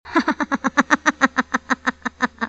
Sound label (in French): Rire sorciere